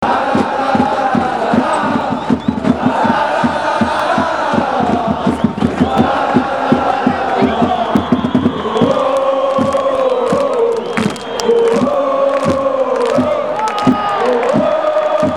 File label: Lalalalalala Ohoooho